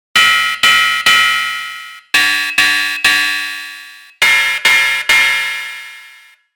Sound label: modulair ride